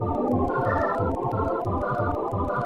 Moonlight Tower B - Ambient

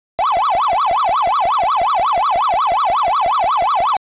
Ghost - Spurt Move; super pac man

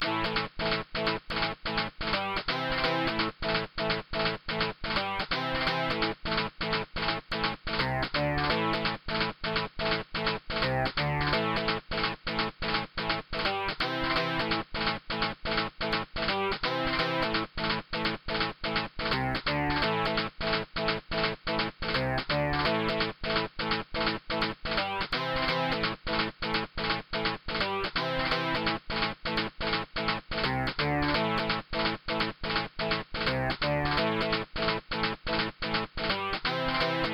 music; WMS Getaway

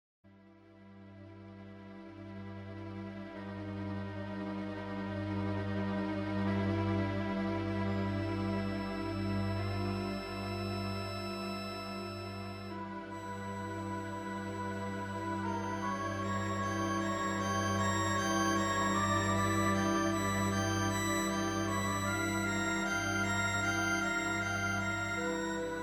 Negative; Star Merc

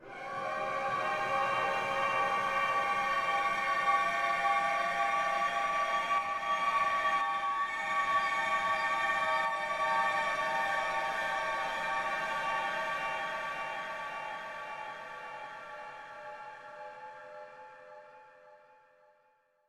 Jungle Suspense Strings

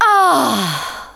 Emote Mistake; Twitch Skijump

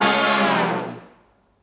Flashover

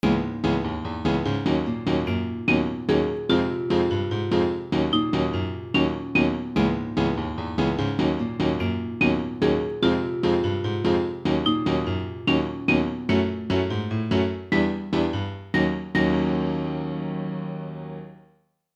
Elevator Jam; Apple Climbers

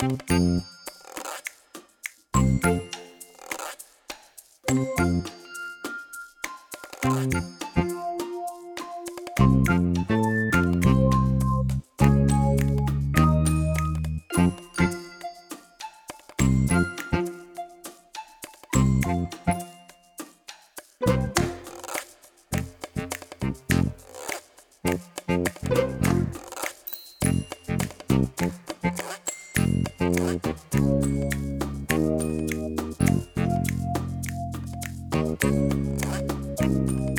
Playground Main 205bpm; Unspottable; Xbox Series X|S

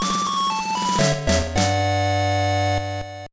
Jingle; Shaman King Chou Senjiryakketsu 3; gba

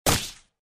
Sfx Archer Attack Hit

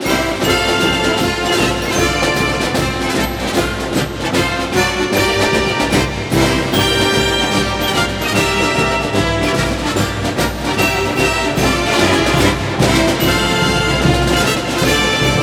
Fight Song; NCAA March Madness 08; PS2, PS3, Xbox 360

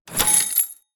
Notification Alert Coins Buy Shop; Sunflower Land

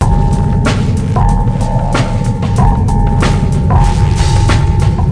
Splash; zoocube; PS2

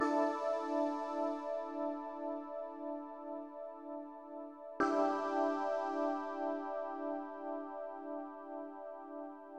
UI MENU PAD; Vostok Inc.

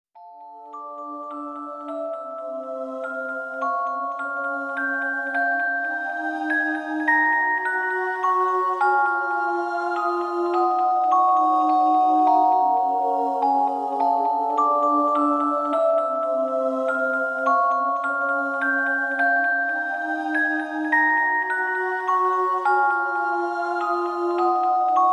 Prayer (Refrain); Jeanne d'Arc

